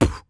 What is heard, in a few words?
Human Beatbox, Beatbox Hits; Vocal Hits; BD; Loopmasters